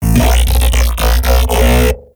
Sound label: Mech Ambient Vocal